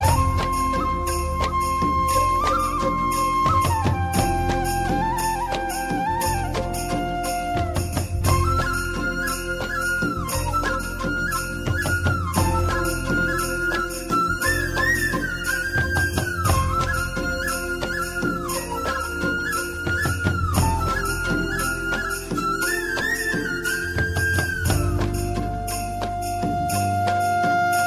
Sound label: Best Fluteeeeeee